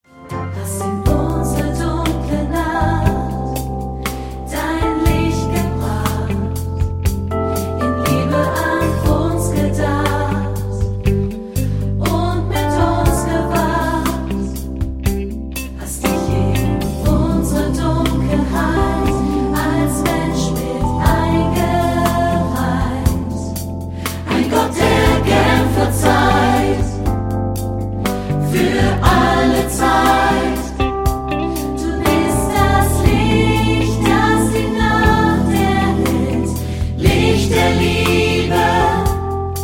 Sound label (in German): StudioChor; Childrens Corner StudioChor